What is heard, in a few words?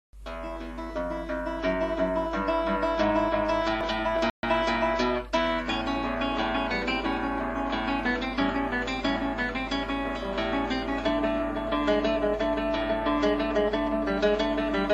Finger race, guitar; Jolly Guitar